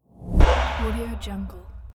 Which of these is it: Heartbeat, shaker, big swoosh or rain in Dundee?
big swoosh